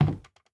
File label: Wood soft